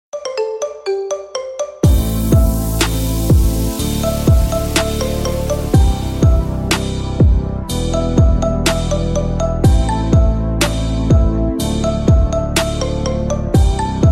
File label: iphone 11 ringtone; android